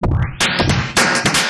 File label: DRUMLOOP D+B 160, Drum+Bass Loop; eJay